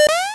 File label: Alert